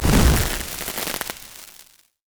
fireworks fire; Fireworks Corki; valkyrie

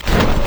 basicattack; LoL; janna forecast

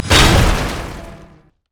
ripple effect; nautilus

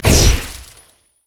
yeti ice blast; nunu

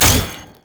spider; LoL; elise base

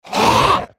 spellcast effort

